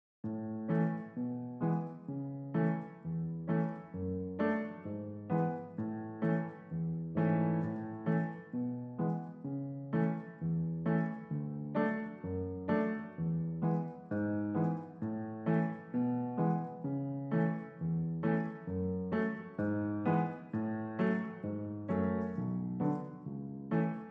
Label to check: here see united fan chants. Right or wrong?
Wrong